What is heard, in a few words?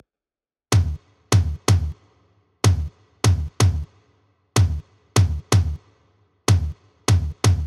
Bass House 125bpm Toms; EDM Drums; Drum Kits; Stems; Ghosthack; Ultimate EDM Drums; Bass House Kit 10